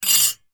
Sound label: household several teaspoons place in cup or mug